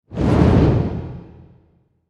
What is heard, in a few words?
Whoosh Low Fast; Blastwave; Sound Design